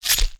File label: blade stab